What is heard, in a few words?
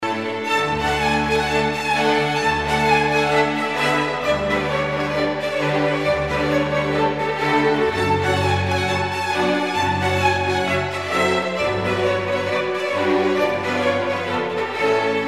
Seven Romanian Folk Dances for orchestra; Bartok